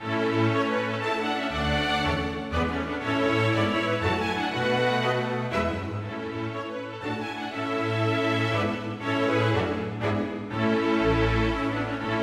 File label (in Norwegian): Menuet for strykekvintett, u trommer; med ad lib trommer